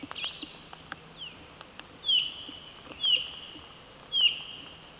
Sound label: Pandion haliaetus